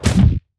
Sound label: Climb; Grounder; Evolve; Kraken